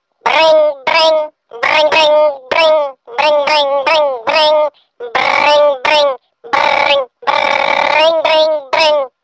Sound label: Broken Telephone